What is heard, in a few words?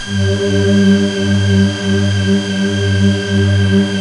Waldorf MW; Rubber Chicken Software Sample Bank